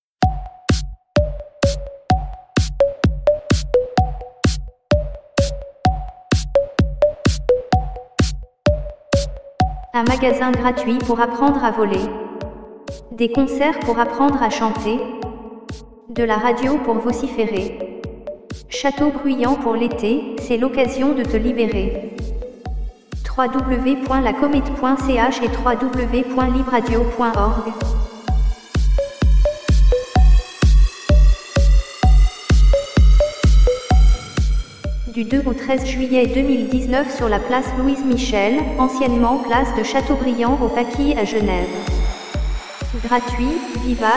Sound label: Jingle2